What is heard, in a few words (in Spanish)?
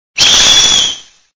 Grito de Arctozolt